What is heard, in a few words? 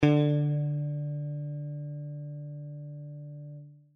guitar d